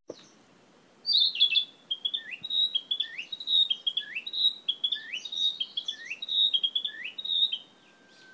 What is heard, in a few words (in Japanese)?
kibitaki